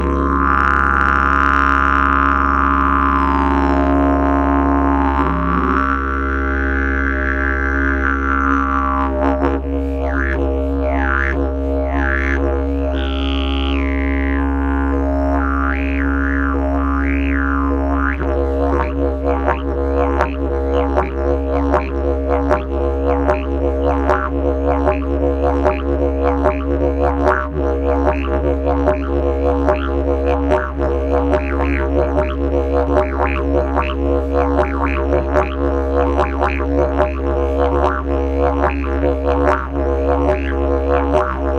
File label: didgeridoo; jilm zimni